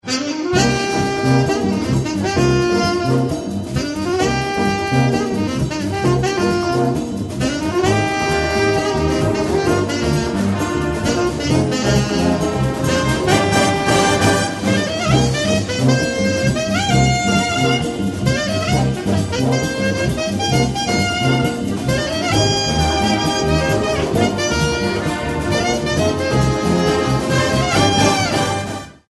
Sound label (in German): saxpack